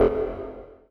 menu click

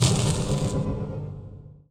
weapon fire

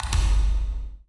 menu online

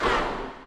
drag release

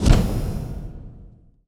menu on button

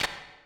menu taping

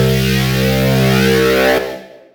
weapon fire

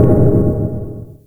plasma hit

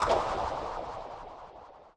menu select line

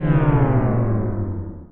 hide menu

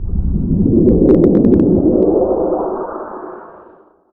weapon fire

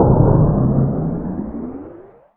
kinetic hit

